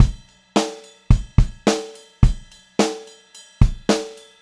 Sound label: POP VARI, Pop-Rock Drumloops; 108 POP